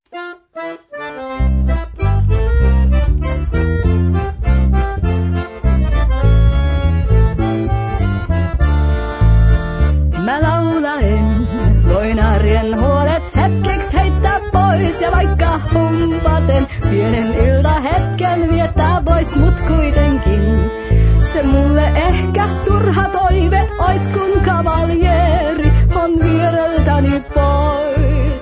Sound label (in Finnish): humppa